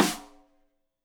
Rim Shot Stereo; Deeply Sampled Drums; SMDrum

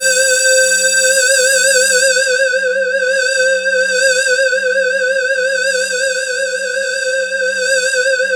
C5, SynthLine; NiceBeats

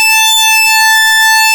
SYNCSWEEP A, ARP Synths; E-MU Formula 4000 Series Vol. 3; Analog Odyssey; ARP Synths II

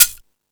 Percussion, Drum Machines E-MU Pro-Cussion

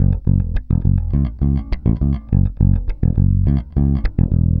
Pop, Bass

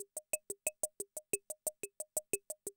CR78, DrumLoops

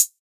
drum hat wide 606; Virtual Riot; Drum Hits

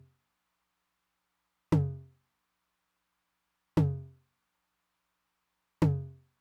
high tom, Roland TR-909; Roland TR-909 Ableton Project

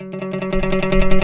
LUAN TRM F#3, LUAN FX; Spectrasonics; Heart of Asia

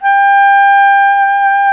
SAX TENOR; Roland LCDP07; Tenor V-sw; Tenor 3way

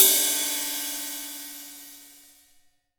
18 OLD, 18INCH OLD ZIL RIDE

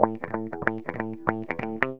GTR, Funky; Zero-G; Funky Elements

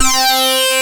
ARP LEAD C4, Analog Fantasies; ARP 01; USB Soundscan vol.49; AKAI

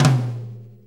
4-4 Tom Flam, Drum Loops

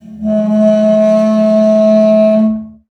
CELLO FINGER; 90 s Sample CDs; Xsample VOL-5

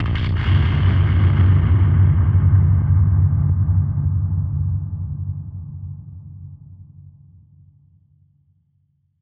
Drum Bomb; musicradar; bomb factory; Drum Bombs

